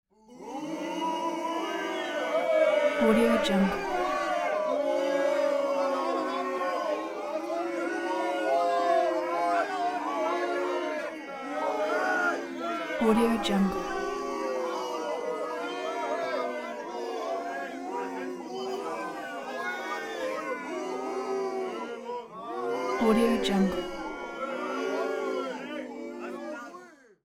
human crowd boo long; smartsoundfx

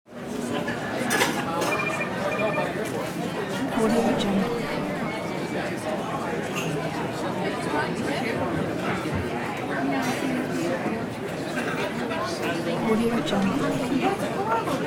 restaurant ambience; audio-tree

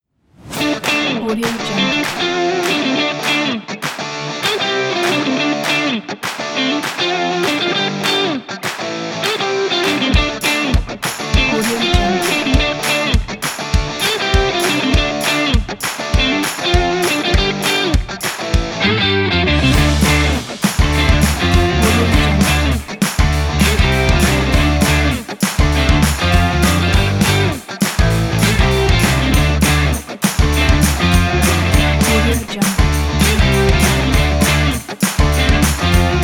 upbeat sport indie rock

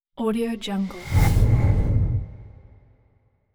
short fast whoosh; hollywoodedge

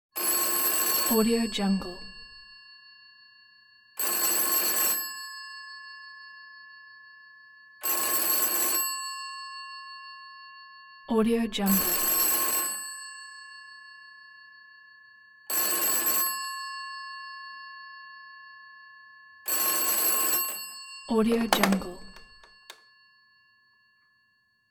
vintage british type phone ringing with pick up

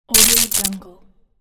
electric zap

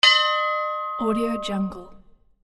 boat bell

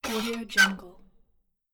old schooner boat lower deck door handle

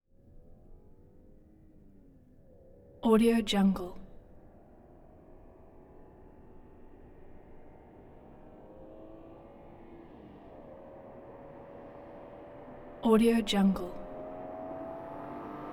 2002 bmw m3 car uphill pass by; prosoundfx

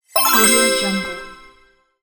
quest complete

